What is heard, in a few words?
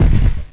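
helicopter land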